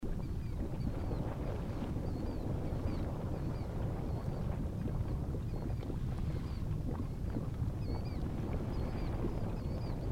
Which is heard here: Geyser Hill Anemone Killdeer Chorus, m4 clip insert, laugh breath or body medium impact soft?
Geyser Hill Anemone Killdeer Chorus